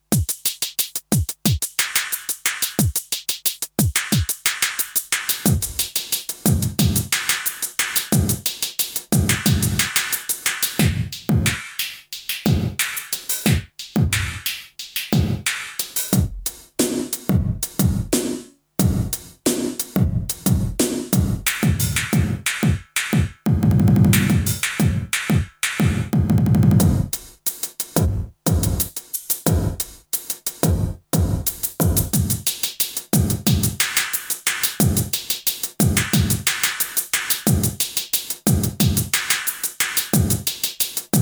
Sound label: Lexicon PCM70 Gated Plate Drums